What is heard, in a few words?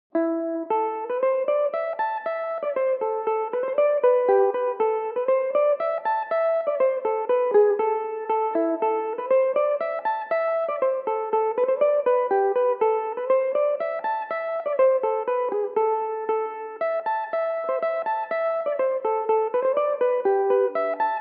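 A dorian, irish mandolin, tunes; last chance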